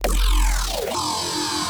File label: Sus, bass